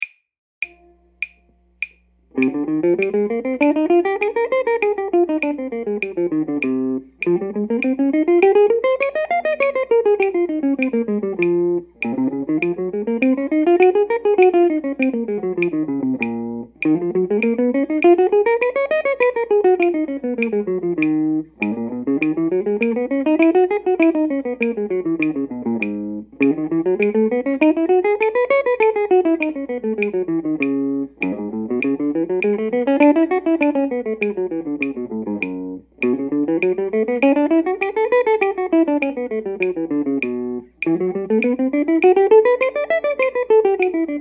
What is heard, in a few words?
natural minor @100